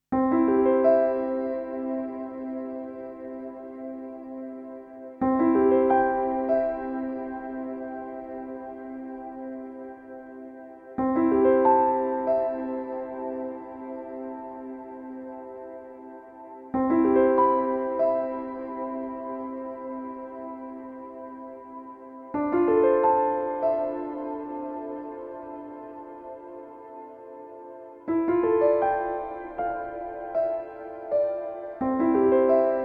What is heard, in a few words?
Piano